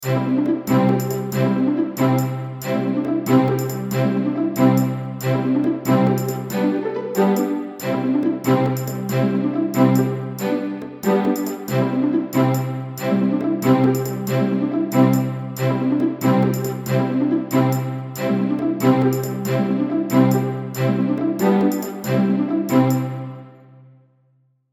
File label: NO MELODY, ukulele; Rainbow Ukulele